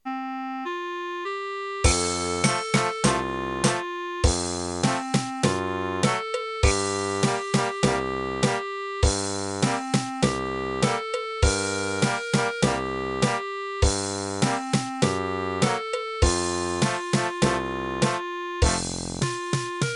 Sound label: toot of the magic trumpet